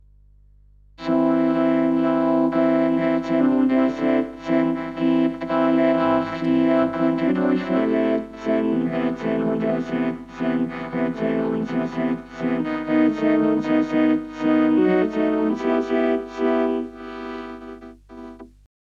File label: Vocoder; 1Up Mikme Vplus